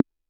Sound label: tom; MFB-522